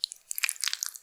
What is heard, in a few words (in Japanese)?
普通, 挿入 or 引き抜かれ終わる直前; ■挿入or引き抜く